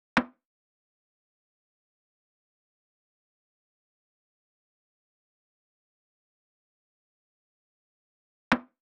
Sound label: Drum Loop 115 BPM Percussion; Loop Stems; Cymatics; DOPE DRUMS VOL. 1; Coffee Drum Loop